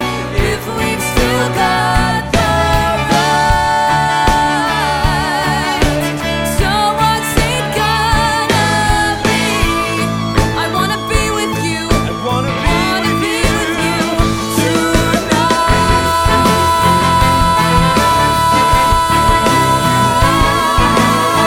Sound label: Riff; Heathers